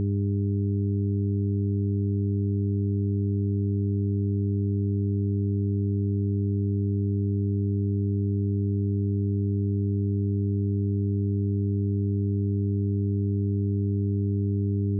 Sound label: multitone harmonics